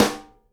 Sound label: snare GLASS, Live Snares